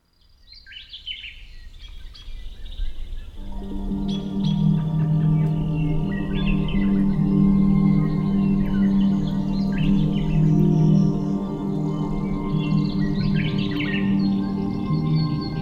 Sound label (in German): Entspannungszeit Melodien der Natur; gefuehlvolle entspannungsmusik